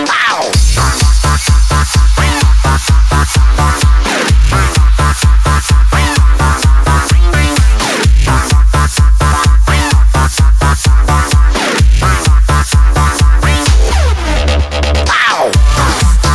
dirty electro 175

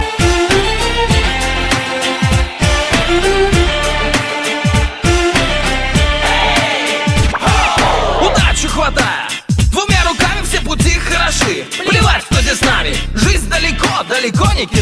hip hop cover

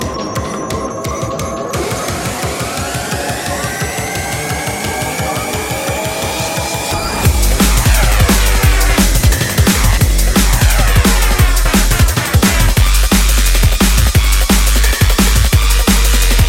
dnb; ringtone

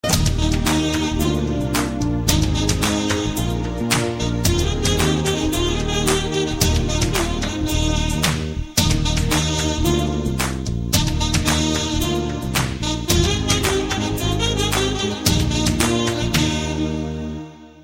sax music

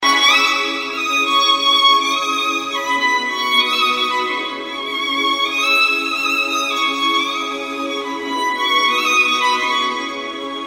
nice violin

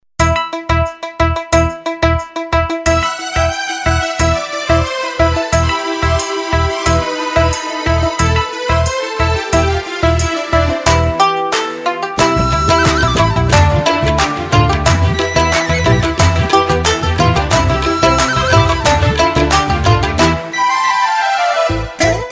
instrumental, ringtone

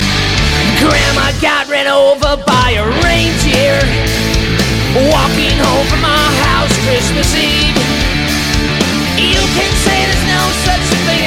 metal

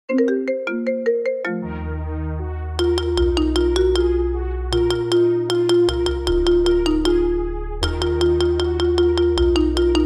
marimba remix

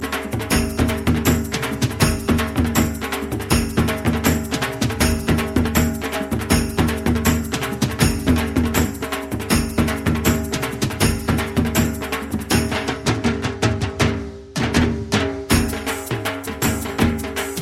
dhol dhamaka punjabi

